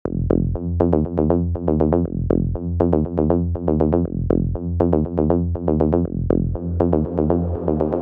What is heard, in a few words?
bassline; monosounds